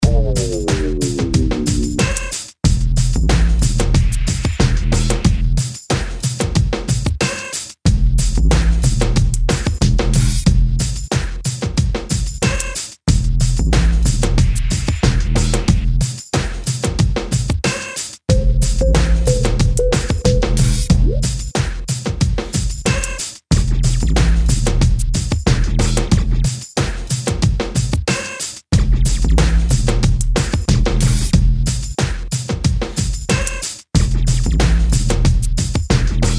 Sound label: Fatty Beat